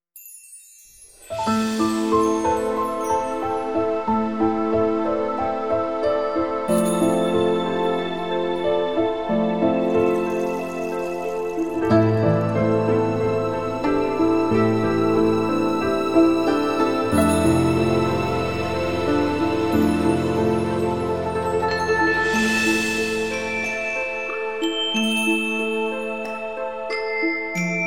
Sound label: music